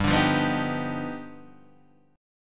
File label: G 7th Flat 13th, G, guitar chord; G7-13 Chord {3 5 3 4 4 3} strummed